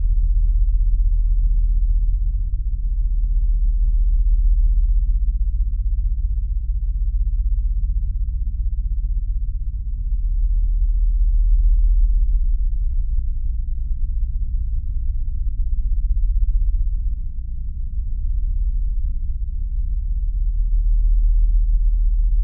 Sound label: Drone Science Fiction Power Source Constant Steady; Production Element Imaging; AdobeCC